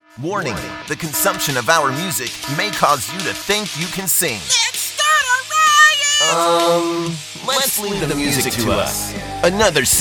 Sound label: Rock